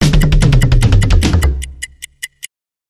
Crash Clock Sting

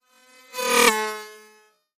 Sharp Razor Sweep, Taylor Grand

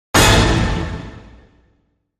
Hit Orch Lonely Heart A

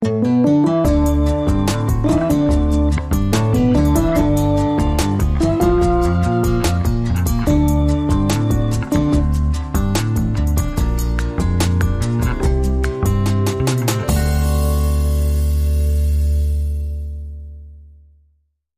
Country Hop

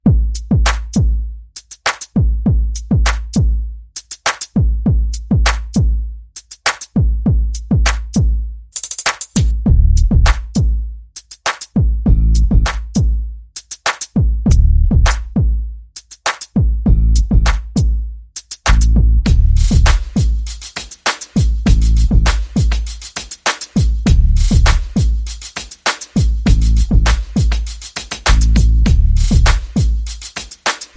Bass and Perc Only

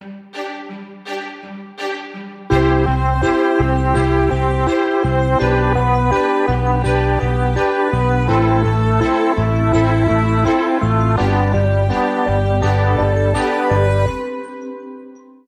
No Drums No Melody

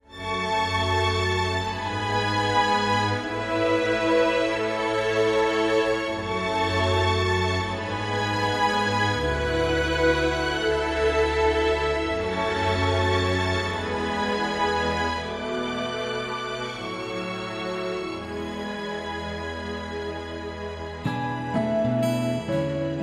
Orchestral

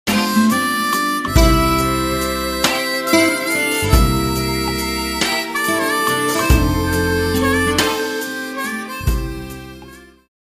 Western Blues

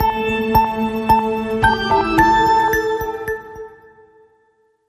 Logo Only Alt

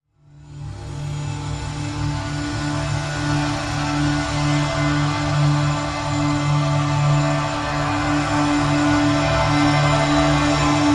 Drn Light Abrasion D

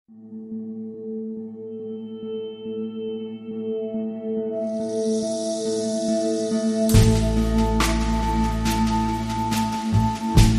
No Guitars

